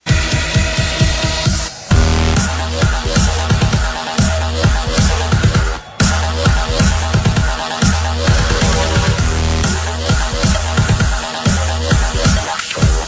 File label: electro ringtone